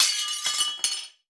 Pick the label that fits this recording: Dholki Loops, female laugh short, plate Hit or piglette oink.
plate Hit